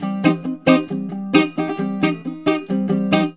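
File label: bouzouki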